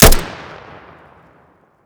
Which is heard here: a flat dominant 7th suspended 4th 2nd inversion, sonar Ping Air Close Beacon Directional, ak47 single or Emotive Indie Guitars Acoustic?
ak47 single